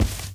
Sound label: walk tile, footsteps